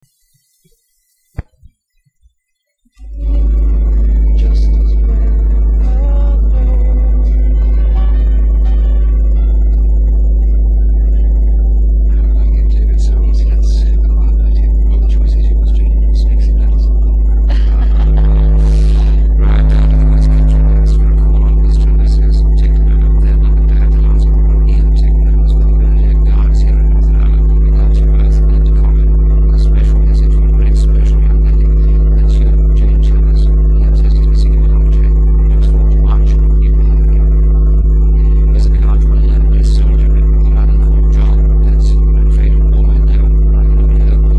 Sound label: Small Tape Right Channel Side Two Mostly Noise